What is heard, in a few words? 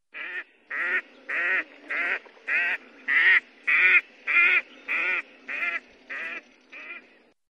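gadwall voice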